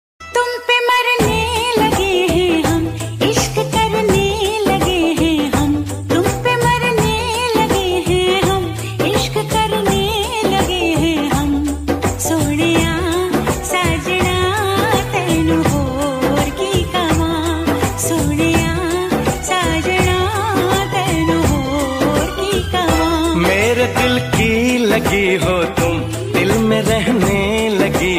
hindi gana ringtone love story ringtone ringtone song hindi song ringtone